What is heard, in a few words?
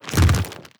step movement, zombie blind, foley; creatures